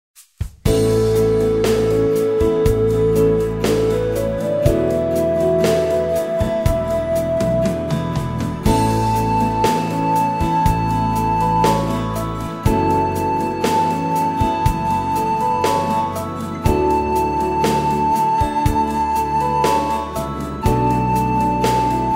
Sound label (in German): blockflötentaxi